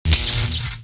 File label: electric; attribute electric; MonsterHunter; Fight; Monsters